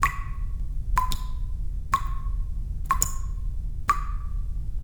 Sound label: waterdrop